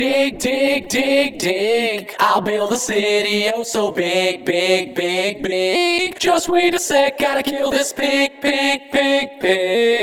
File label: Vocals